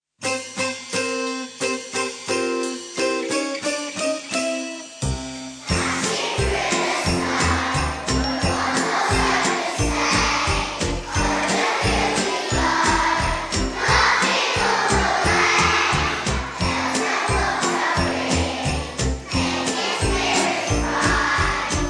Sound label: Jingle Bells